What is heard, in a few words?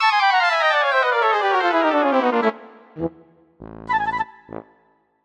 Lose